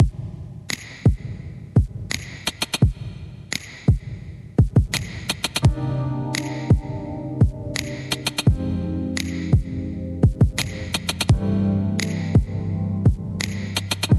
Groovy Chill Funk

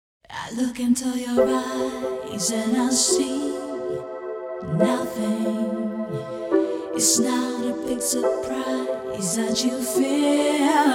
chillout downtempo mix

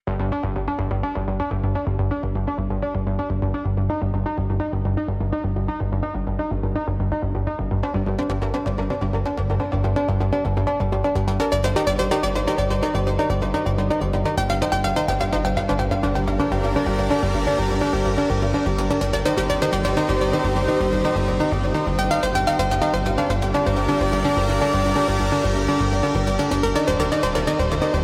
Electronic Impulse

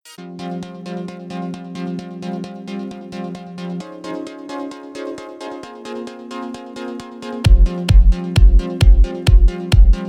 aerobic dance 432 hz; 133 BPM

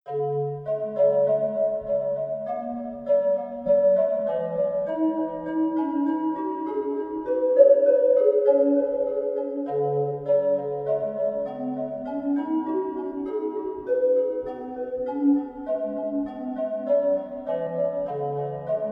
gentle sound 432 Hz

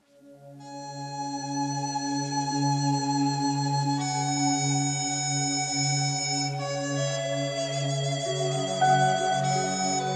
Tranquil Vibes

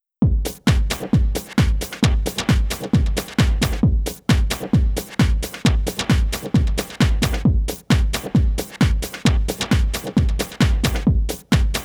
sitar 432 Hz; get power

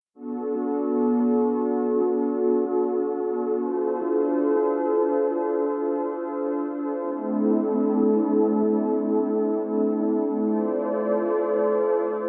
guitar chill floating slow 432 hz

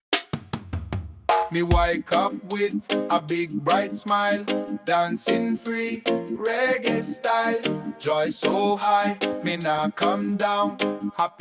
reggae

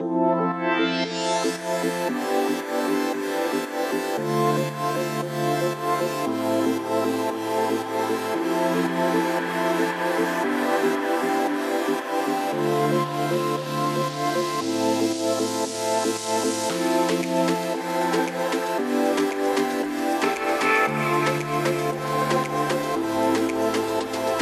electro dance

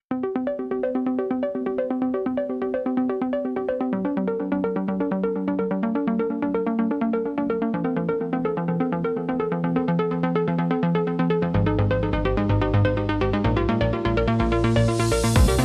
Electronic Impulse

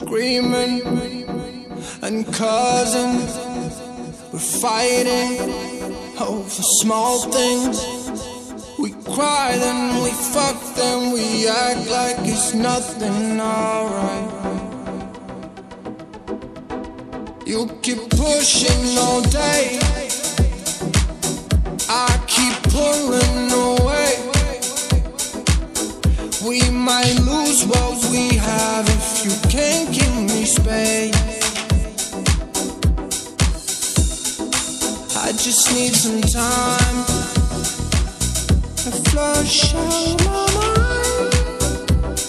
Dancefloor Groove

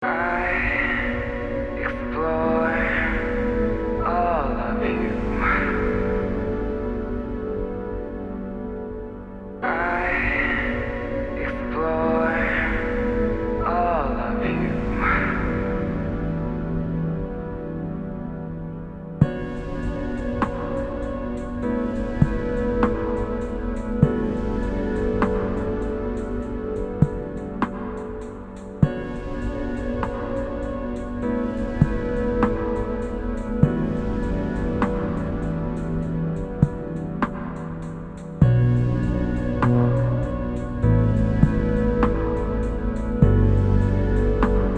nostalgic chillout; Whispering Winds

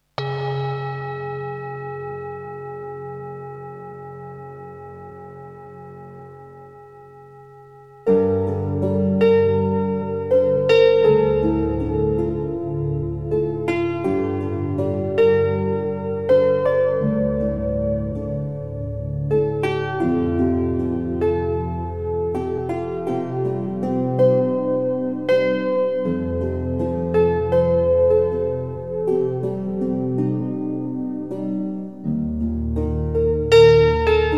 music of the paradise, 432 hz; harmonia wyciszenia; SPA Music

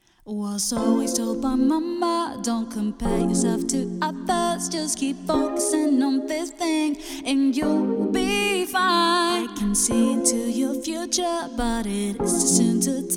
chillout downtempo mix

